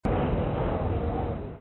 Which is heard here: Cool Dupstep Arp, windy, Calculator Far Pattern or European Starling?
windy